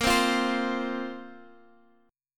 A sharp Suspended 2nd A sharp down Guitar Standard; strummed; capo=2